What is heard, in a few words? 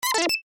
UI Rollover Major, User interface feedback sounds